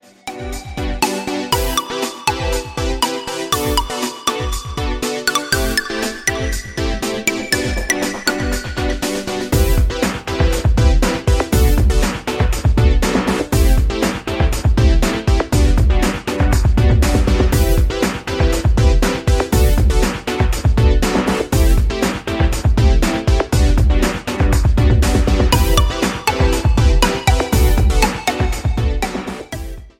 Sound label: Light electronic; Light electronic white collar; MIUI12